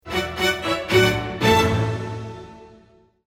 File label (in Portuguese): SINAL SONORO